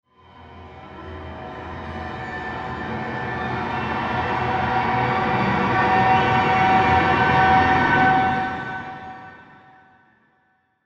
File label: orch cluster